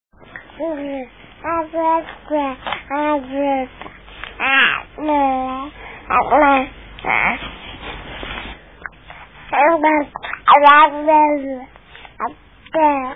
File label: babble; November 30: Pro babbler